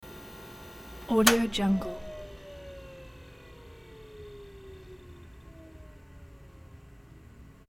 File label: copy machine shut off; hollywoodedge